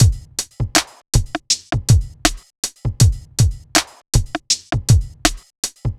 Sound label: drum loop